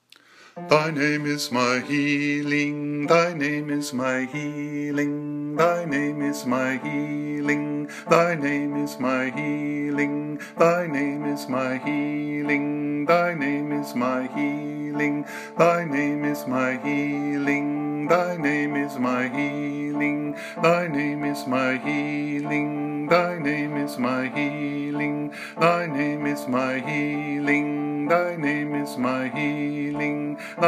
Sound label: bass voice